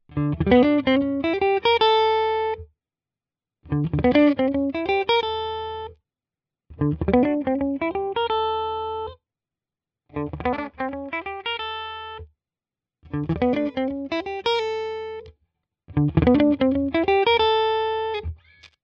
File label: clean all positions Melody